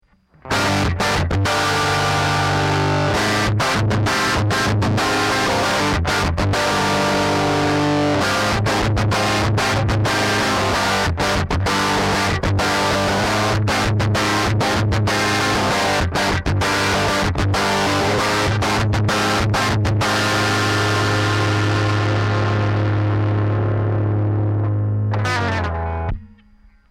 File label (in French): disto rythm; rythmique son disto sans reverb